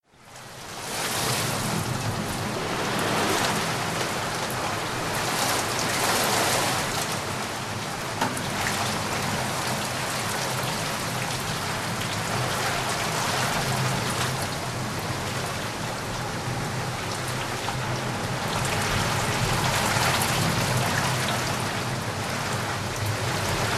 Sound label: Rain Fall Jungle